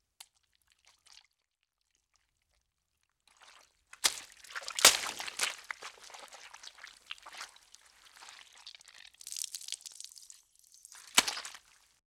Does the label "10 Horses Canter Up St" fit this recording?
No